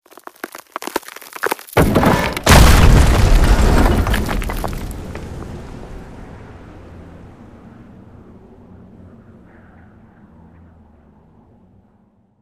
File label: Statue Break; Dream Garden